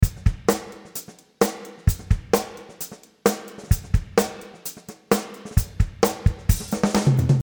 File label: Drum Drum Chamber